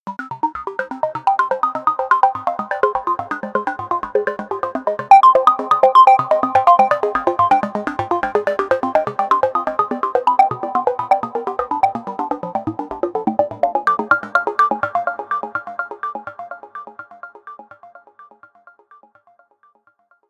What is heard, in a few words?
Reso Arp